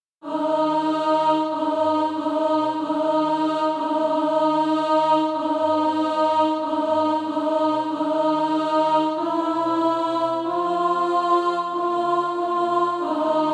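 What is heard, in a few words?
alto, SATB Practise Music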